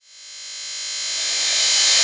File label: Reverse Cym, Cymbals